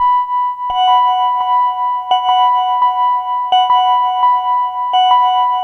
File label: Gong Loop